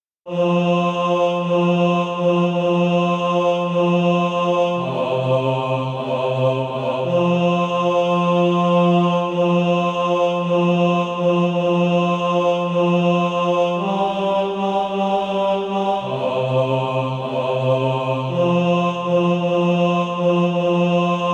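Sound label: bas, Practise Music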